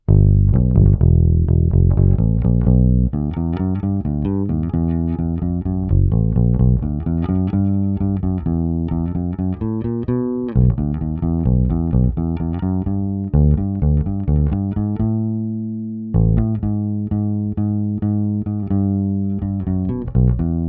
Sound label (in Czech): Jay Turser Precision bass, Originální snímač, tónová clona otevřená; JTB-400C-BK-A-U; Struny LaBella 760FGS-B Deep Talkin' Bass Gold Flats 5 Standard, 45-128